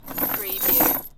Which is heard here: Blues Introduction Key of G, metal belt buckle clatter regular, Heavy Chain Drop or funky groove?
Heavy Chain Drop